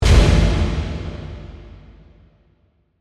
Hit Flashback, Reverb; Hit Sound Effects; BlastWave

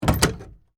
Cabinet Door Close, Pad, Lock, Latch; Cabinet Doors; BlastWave

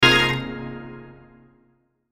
Hit Flutter Reverb; Sound Design; Blastwave

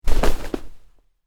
Cloth Character Movement Foley Whoosh

